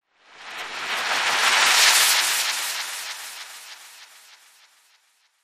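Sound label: Impact Insect Flight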